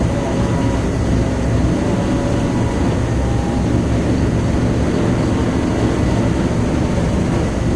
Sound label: tank engine int rpm 00; engines; War Thunder